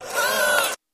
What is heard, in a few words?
Door Outer Open, Damper, Wavering